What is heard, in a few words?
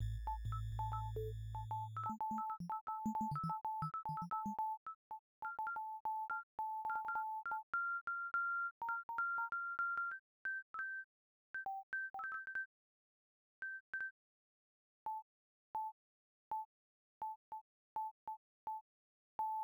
8bit